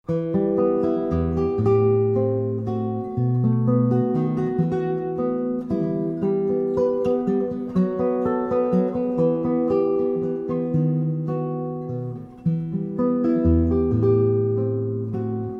Waltz Espanol, General Guitar Methods

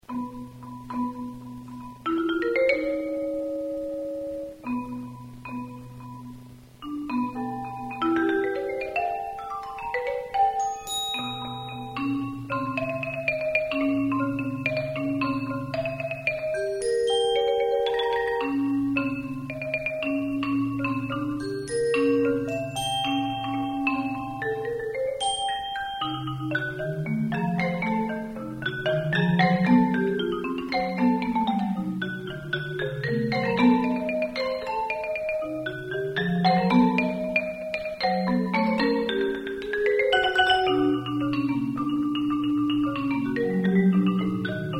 music mallets; C Alan Publications

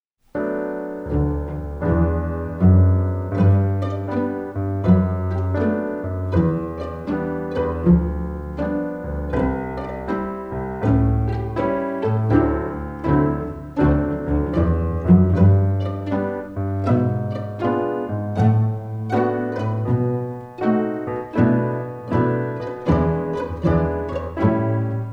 blues; wingert jones music

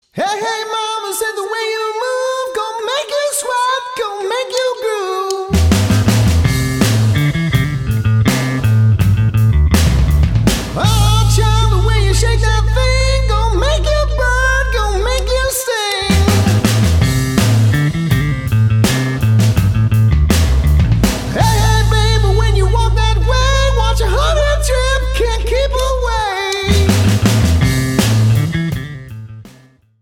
MINUS GUITAR; rock guitar metal guitar

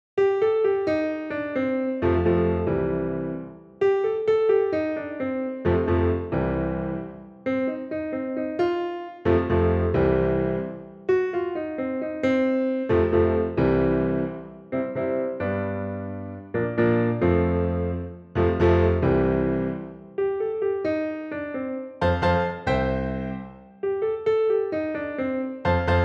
piano

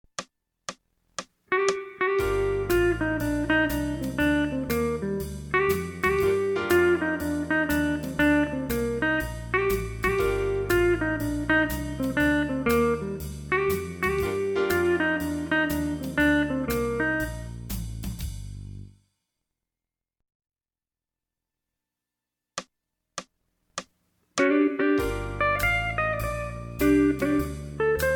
Aeolian Mode Riffs, jazz guitar

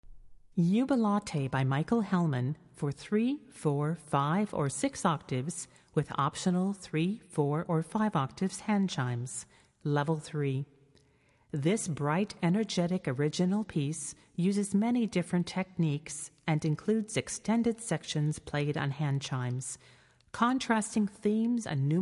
Handbell Music, Handbells 3-5 Octave; Publisher: Chorister's Guild

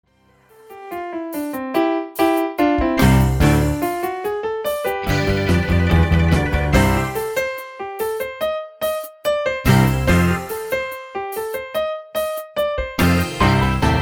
Boogie Woogie Rock, piano

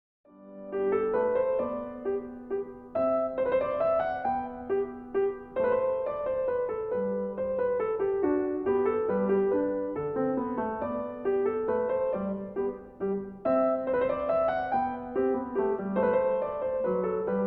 Menuet (G Major), piano

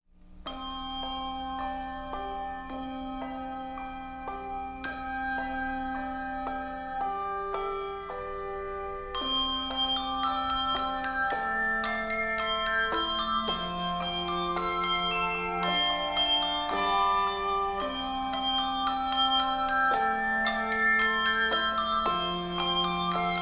Handbells, Handbell Music; Publisher: Jubilate Music; Composer: Prichard, Rowland

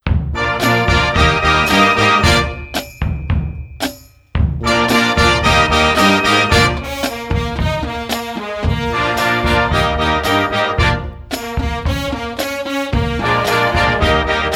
Marching Band

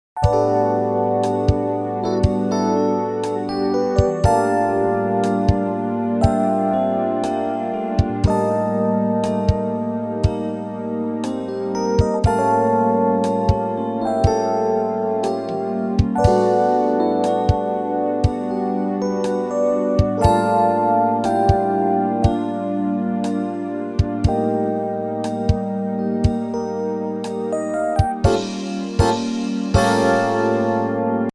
Jazz Improvisation Pentatonic; Alfred Publishing

